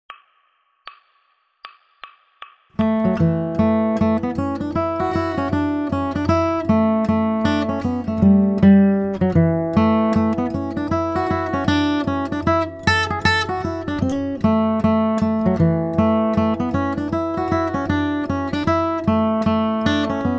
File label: Bluegrass Guitar, fiddle tune with more notes